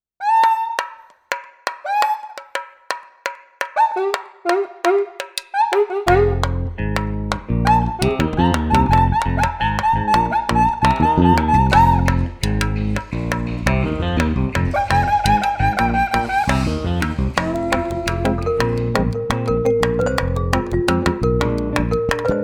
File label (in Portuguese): tamborim de prata